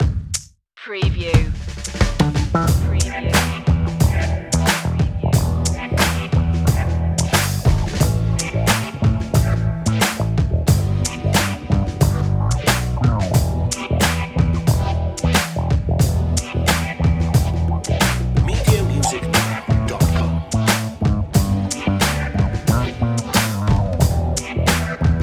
crazy funky bass

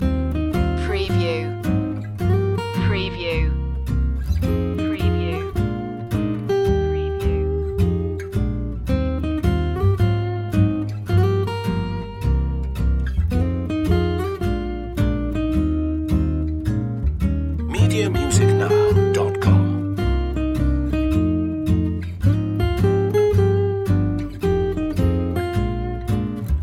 loop

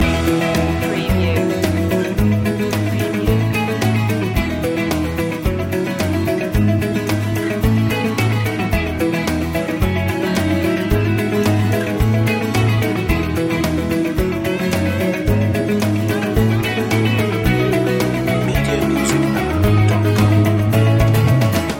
motivational living loop

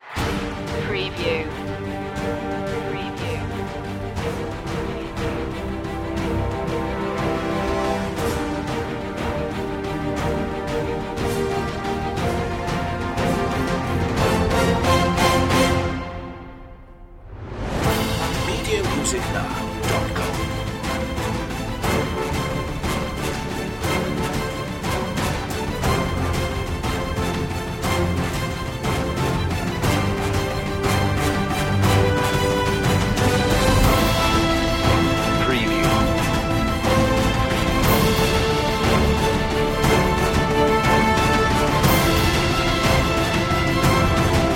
no choir

